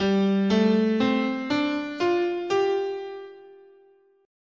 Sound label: G Suspended Pentatonic, scale